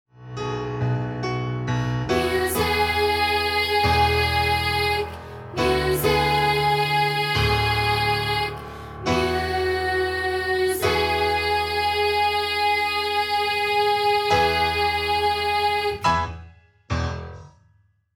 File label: Music Matters Bottom Note